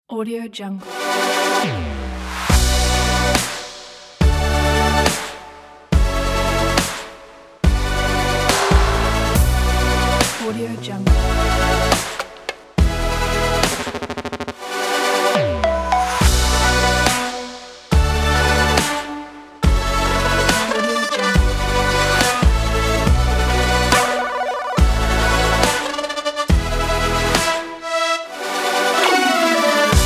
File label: modern urban style